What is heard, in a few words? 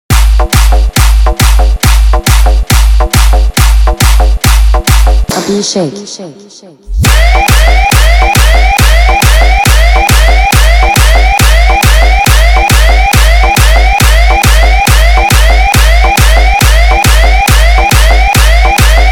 Police Siron EDM